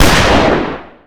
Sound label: Gun Pistol Shot; Weapons